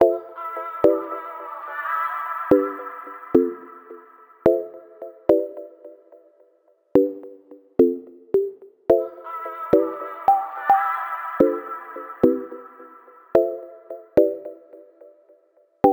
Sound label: songstarter 108 C, Pop; Slate; SD PASADENA Pop Sample Pack